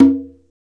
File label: PRC CONGA